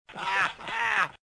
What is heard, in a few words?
bird laugh